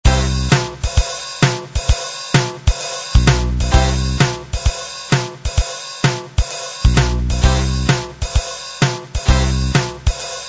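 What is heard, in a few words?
blues with breaks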